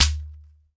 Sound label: SHEKERE, Drums&Percussion; E-MU Producer Series Vol. 7 – Old World Instruments